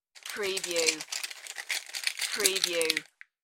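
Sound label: Plastic Packaging Crinkle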